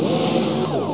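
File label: door close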